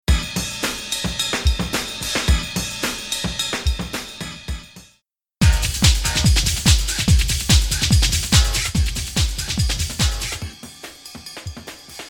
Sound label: (Forward Wind) - Break